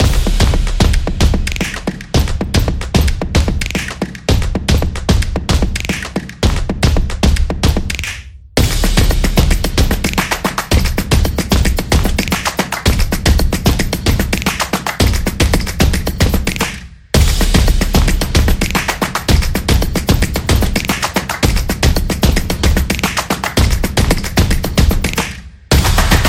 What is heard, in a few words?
Drums Loop; marathon